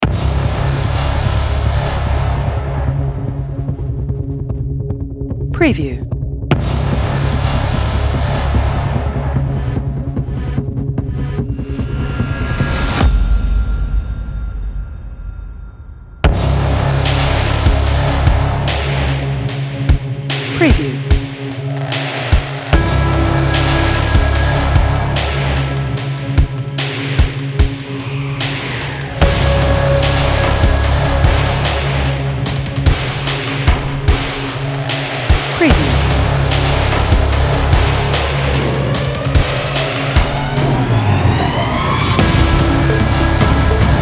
Sci-fi Futuristic Cyberpunk Cinematic Trailer Music